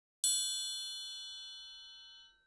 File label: triangle